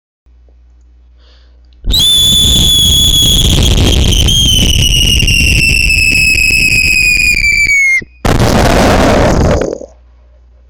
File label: nuke